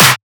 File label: clap oneshot; GhostHack